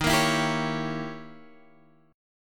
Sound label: D sharp 7th Flat 9th Guitar Half Step Down; D#7b9